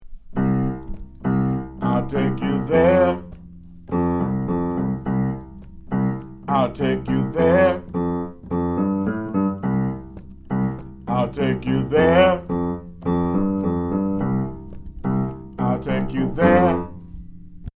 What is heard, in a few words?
Bass